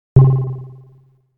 Vibra, synthlead

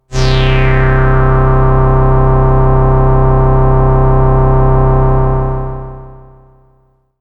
Sun-Sigh F, synthpad

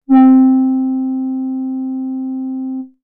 Basic Pulse, synthlead

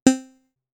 Short, synthlead

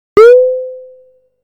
Wasp-Drum; drums

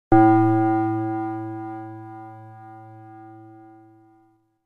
Grandfather's Clock

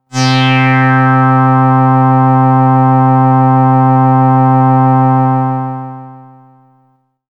Sun-Sigh E, synthpad

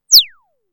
Little Bird

